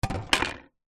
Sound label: foley plastic thin square panel plate down on wooden surface; zapsplat